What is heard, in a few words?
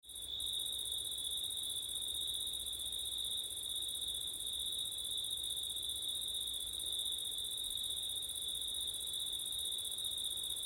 crickets wind september night; ortif